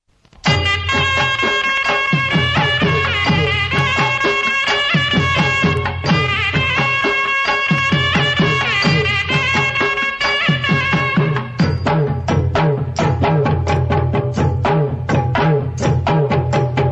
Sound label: Folk Tune